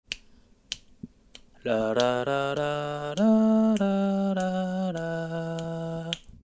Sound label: VOCAL SAMPLE